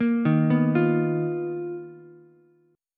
B Major Sharp 11th, Ukulele, C6; BM#11; arpeggiated; JGuitar

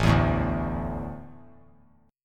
A Suspended 4th; Asus4 strummed; JGuitar

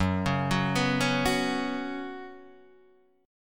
F sharp Suspended 4th, Guitar, Open D; 4,4,4,5,4,4; down

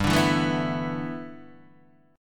G 6th Add 9th G Guitar DADGAD, chord

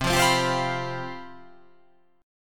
C Major 7th Suspended 4th, down

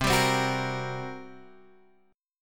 G Minor Major 7th Double Flat 5th, C

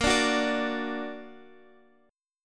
F sharp Augmented; x,x,8,7,7,10

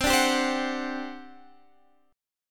G Major 7th Suspended 4th, C

